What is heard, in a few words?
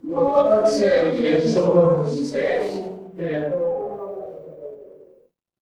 crowd murmur